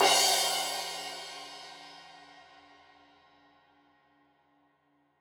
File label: Crash 15in L edge, Cymbals Stereo